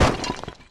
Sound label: Stone Breaking; openFracture